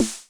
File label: Dance, Electric Snare; Yamaha DTXpress; drum machine